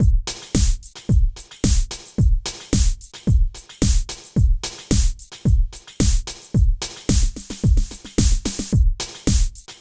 TECHNO; INIS; Funky Xtreams

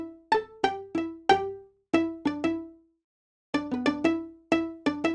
strings, analog funk; Mixed Berry Jam; Sonic Foundry (Sony Creative Software) - What it Is! 70s Analog Funk

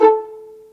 PIZZVLA E3; AKAI S-Series CD-ROM Sound Library VOL-2

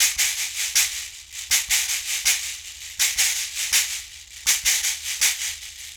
Berimbau Shaker; World Percussion

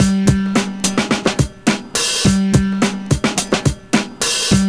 PIANO STAB; Univers Sons - Kitch 70s

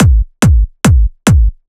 PWM Kit 142 BPM Kick; Vengeance - Essential Clubsounds Vol.5; VEC5 Demo Constr Kits; Root Gmin; Drums & Perc

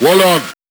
vox 12khz; M8; M8JUNGLEPAK1

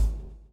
BLOW; Spectrasonics - Backbeat

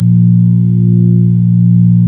ORG B3 A#1; Best Service Gigapack 2

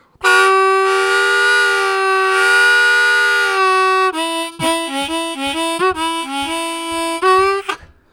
Grind, Harmonica; Zero G

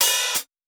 tight open HH; ONE SHOTS; HI HATS; breaks; Basement drums vol 2

